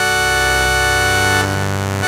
Nazz clouds, Pads; Sonic Foundry (Sony Creative Software); Synchro Funk

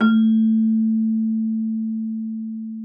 Celesta A3; Xsample VOL-8

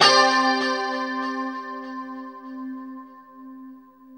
2CHD C 5 -L, Guitars; Spectrasonics; Hans Zimmer Guitars VOL-1; JC-SHEEN

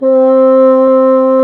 BASSOO, Bassoon; Sound & Vision - Gigapack I CD 2 (Roland); Brass&Woods1

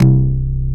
Korg CX3, C 1

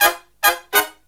Brass Riffs; USB Soundscan vol.29; AKAI

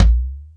House Machine, E-MU Pro-Cussion

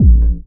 KICK, Fresh Disco House; USB Soundscan vol.20 - Fresh Disco House I

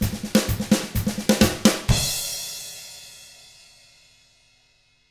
127 Drums

